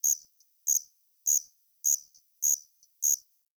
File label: Tree Cricket; Warframe; Ambience; Nineteen Ninety Nine